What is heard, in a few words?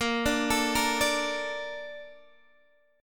A sharp Major 7th, Guitar Open D